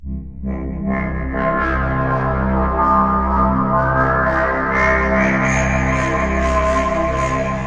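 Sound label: pad C, 125 bpm, synth and electronic loops; melodic loops; poquitomass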